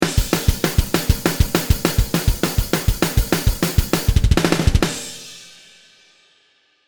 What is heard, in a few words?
HH DT Groove stop